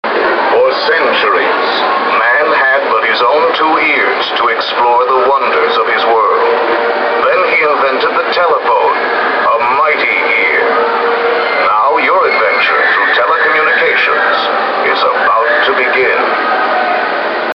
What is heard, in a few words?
phonebooth